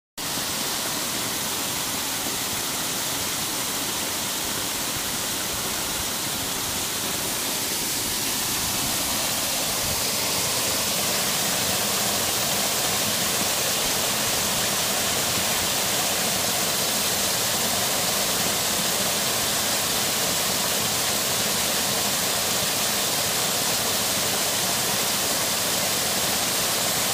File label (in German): Wasserfall